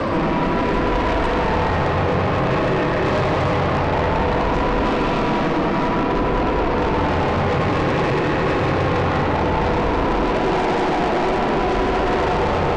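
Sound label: 8-bit distorted horror ambience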